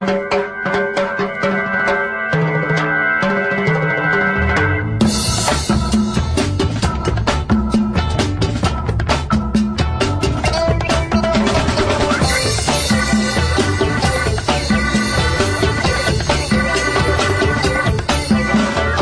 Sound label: Afro Percussion